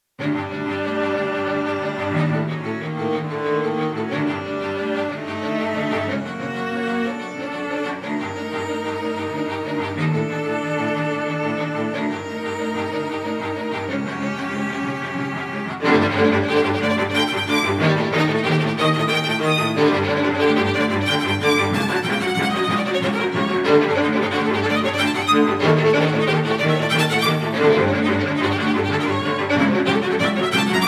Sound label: Fire Dance